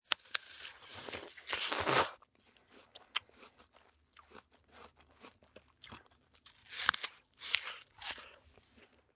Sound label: Eating Apple, Eating crunchy, apple, slurping (human voice); VoiceBox